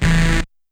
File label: Hard Stab L; YAMAHA RM1X